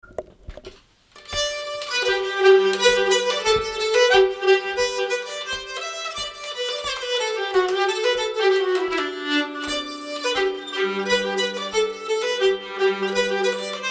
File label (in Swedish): g dur polska; bysscalle